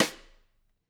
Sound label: K2 room SdSt, drum